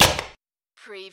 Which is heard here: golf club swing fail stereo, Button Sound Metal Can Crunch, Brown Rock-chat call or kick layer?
Button Sound Metal Can Crunch